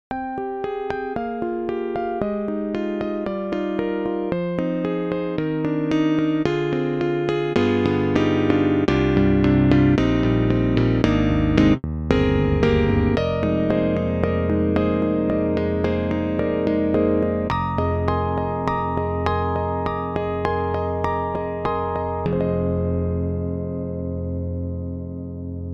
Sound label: Yamaha CP80 Electric Grand; Greg Sullivan